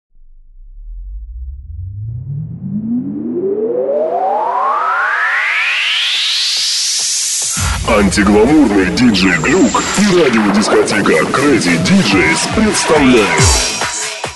UK Hard House

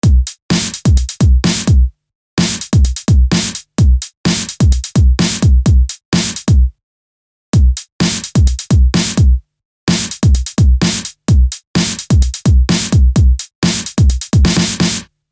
BeatLoops Breaks BreakBeat

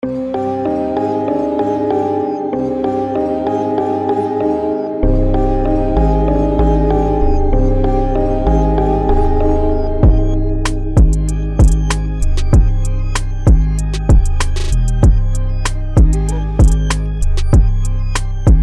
Trap beat

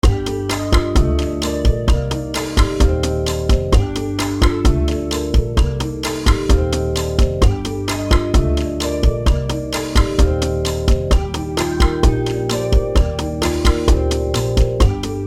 Percussion test

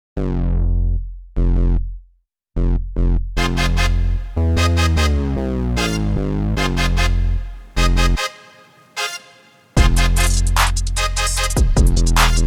MODERN TRAP

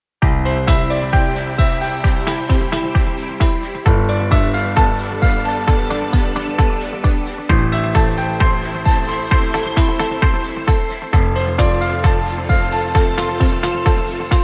Happy Corporate

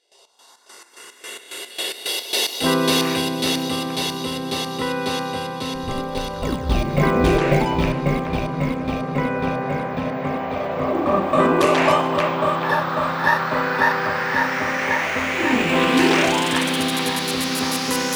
Jingle Relax

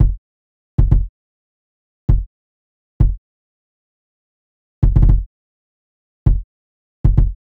Kick 115bpm

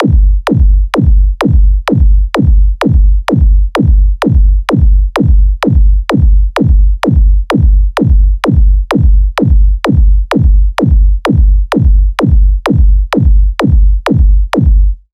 Beat 128 bpm

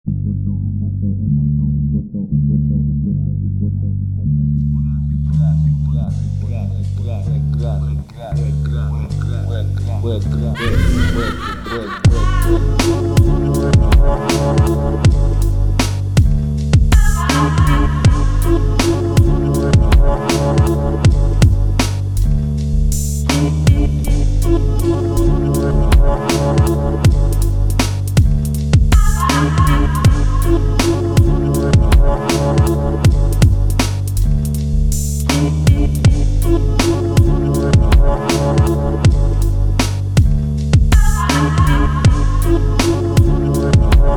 instrumental

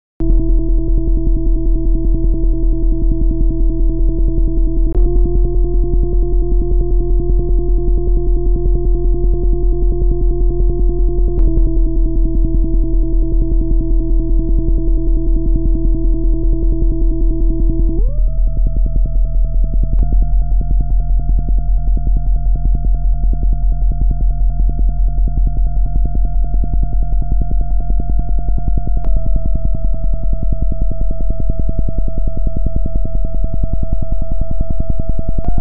bass low; Пульсация Неба

